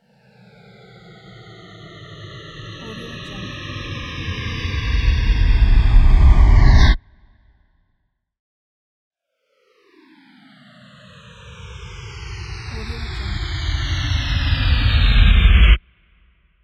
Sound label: long downlifter; transitions and movement